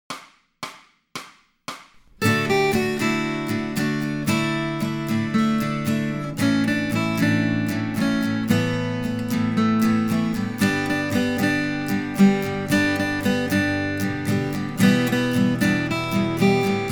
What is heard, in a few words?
Accompaniment, acoustic guitar; Grade 3